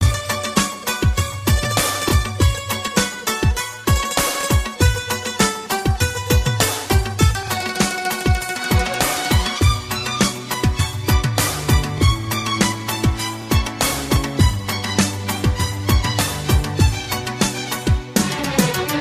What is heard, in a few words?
Tamil Love Music